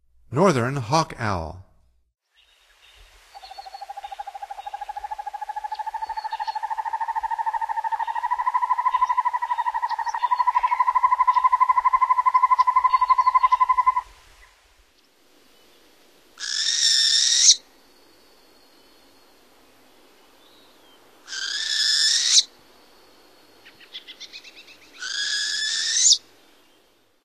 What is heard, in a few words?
Northern Hawk Owl, Songs of Birds of North America; Stokes